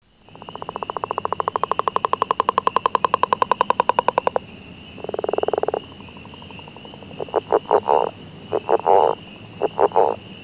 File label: northern leopard